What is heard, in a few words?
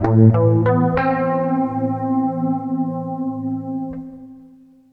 CLEAN, CLEAN GTR